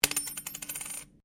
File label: Coin Penny Single Spin Laminate; Common coins; Money; Objects